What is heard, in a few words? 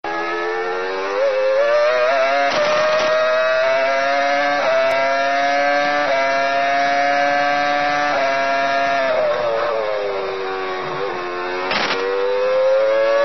Super Engine Sound